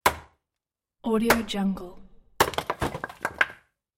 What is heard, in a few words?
impacts axe wood chopping